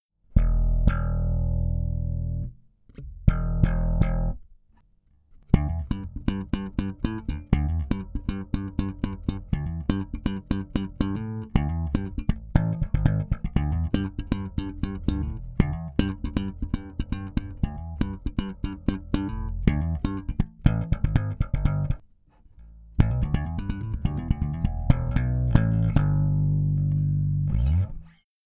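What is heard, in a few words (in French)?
réglage plat gain fort compresseur slap ACTIVE, Bass; smpro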